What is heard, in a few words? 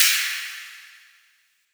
mouth splash, cymbals